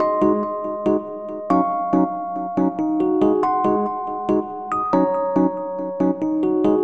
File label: rhodes